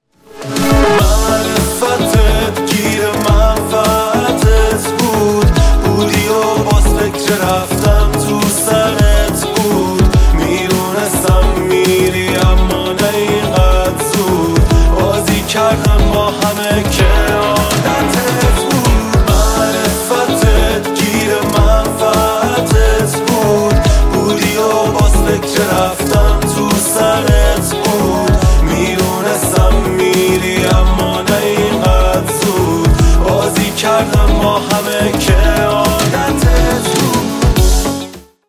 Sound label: Mobile Ringtone